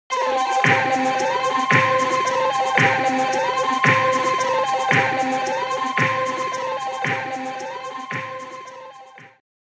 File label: Jungle Ruins Map; runner3